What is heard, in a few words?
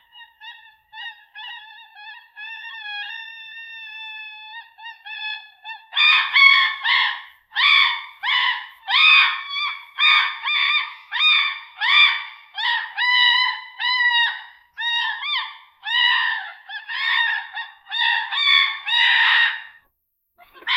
a screeching whale; marine life